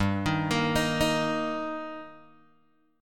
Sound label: G Minor Sharp 5th G Guitar DADGAD, chord